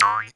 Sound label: Boing, cartoon; Classic SFX; musicradar; cartoon caper